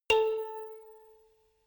notification kalimba rattle

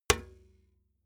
metal bucket hit bottom

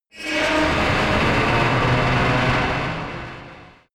metal metal grind large

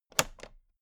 toolbox latch close heavy

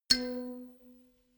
notification kalimba rattle low

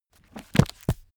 heavy book shut

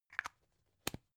plastic spray can small close

